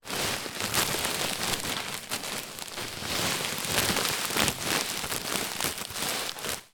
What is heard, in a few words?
office packing peanuts in large plastic bag movement; zapsplat